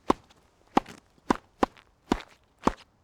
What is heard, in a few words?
Tennis Forehand